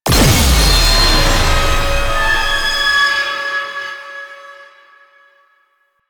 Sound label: Weakpoint Destroyed; Enemies; Lasrian Tank; Warframe